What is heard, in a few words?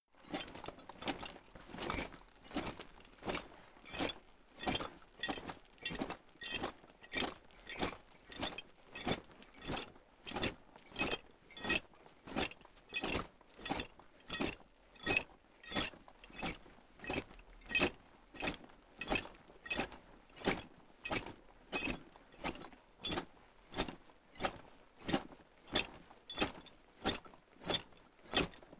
troops leather metal walk